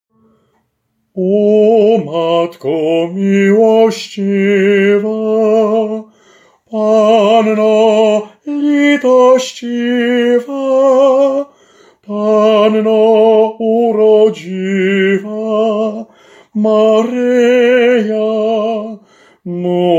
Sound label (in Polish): TENOR; O Matko